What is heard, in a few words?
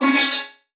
Animation Electronic; Adobe; Multimedia Internet CD-Rom Flash